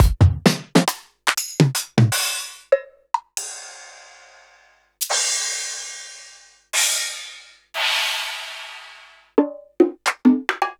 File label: drum